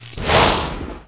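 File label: electric cast, spells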